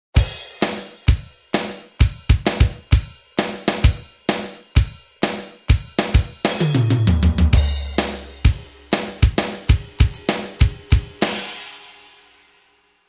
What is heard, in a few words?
shuffle